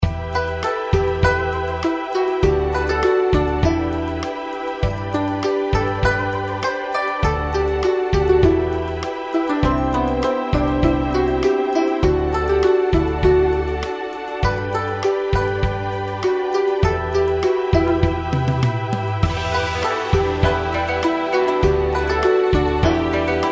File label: reelspin fg; Golden Shanghai